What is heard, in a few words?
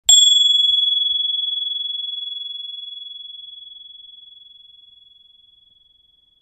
sky slice; neptun